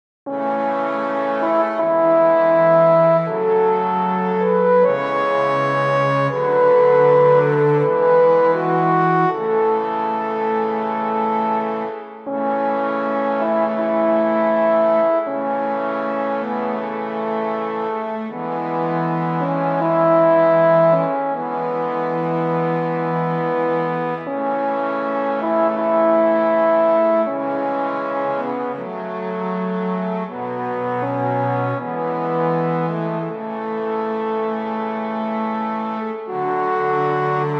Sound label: Going Home Karaoke